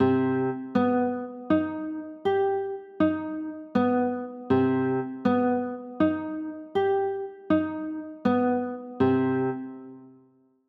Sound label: c m maj7, chords